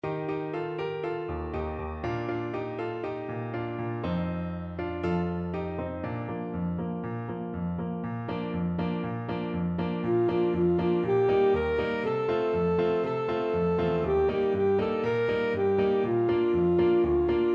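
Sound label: alto saxophone; falile se kastelanke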